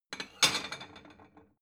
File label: plate small shelve slide